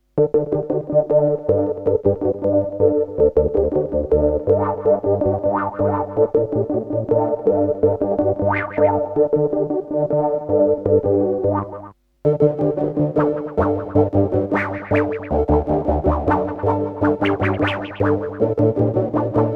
Prophet 10 rev4 Rave vs. Prophet T 8 Ursa Major Space Station